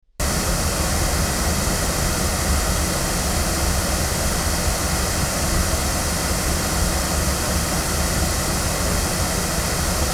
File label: Avant CV-28 omni loudspeakers